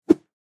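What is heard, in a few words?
zvuki vzmaha, swing whoosh sounds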